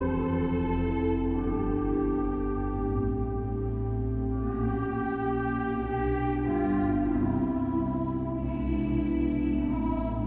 Koor gemengd

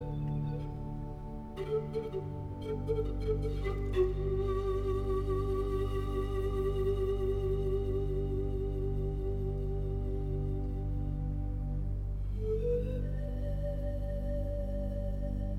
Patel roumain (panfluit)

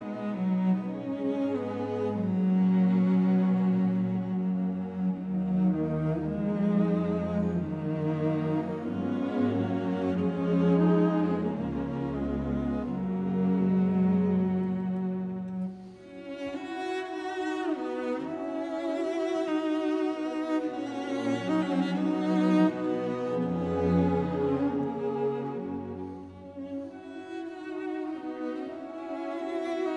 Andante Cantabile (cello & strijkorkest); Tsjaikovsky

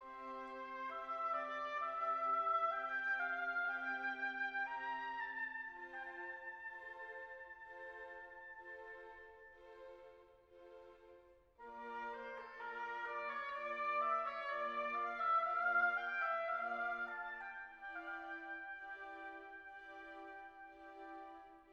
Hoboconcert in D Minor; Marcello Alessandro

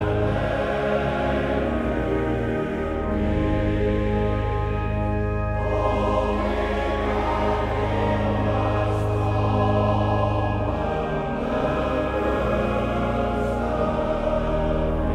Koor mannen; Westlands

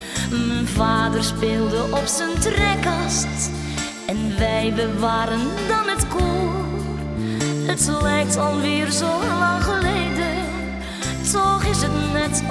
accordeon

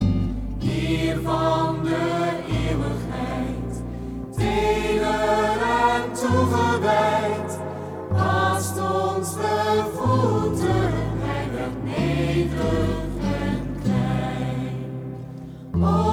samenzang

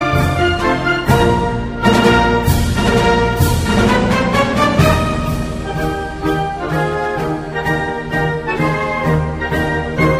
Italiaans volkslied ( Instrumentaal )

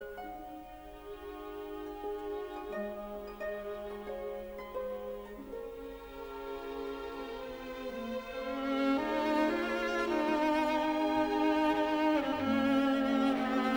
instrumentaal; Puccini; Che gelida manina

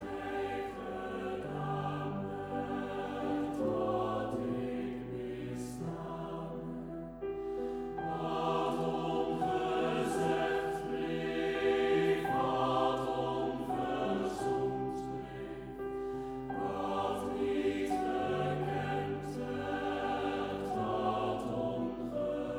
Gezongen Gedicht

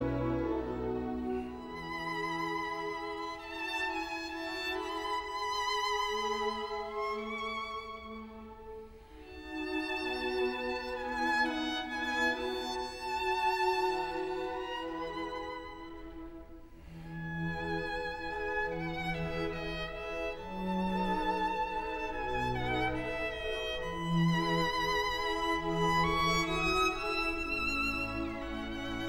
Adagio in e (viool en orkest ); Mozart

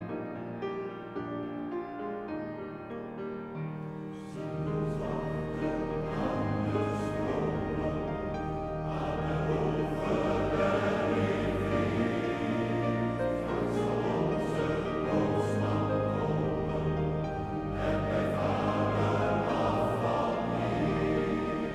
Koor (mannen); Chr Assen

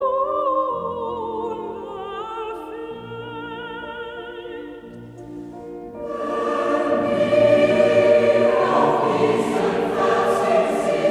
Koor gemengd; Hulster

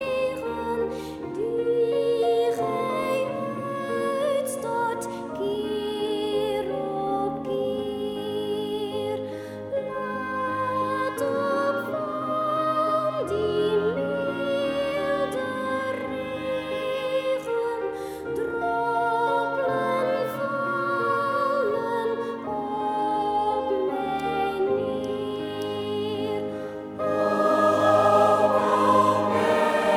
Koor dames met sopraan

